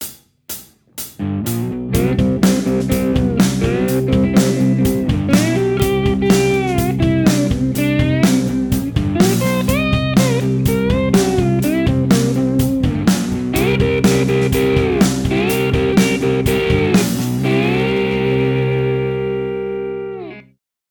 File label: Slide; Guitar Techniques 2019 Issue 290 January